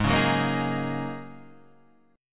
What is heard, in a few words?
Ab 7th Suspended 2nd Suspended 4th down Guitar Open D